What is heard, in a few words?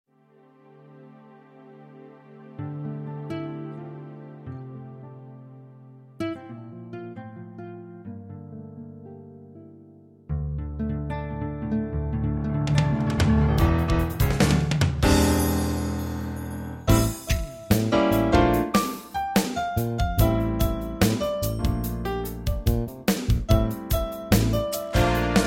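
Roland JV1010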